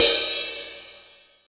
reverb crash cymbal sound b key, Royality free crash cymbal drum sample; cymbal drum samples